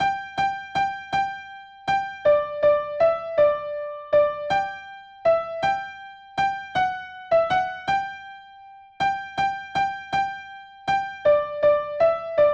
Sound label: Alto Part; choral; A Lieta Vita; These are individual parts broken out for songs in the choral ball set